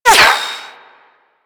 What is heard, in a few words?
Bullet Whizzby; Warframe; Weapons